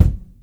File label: solid kick drum sample f key; tuned drums f key